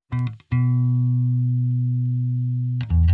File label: bass; musdb18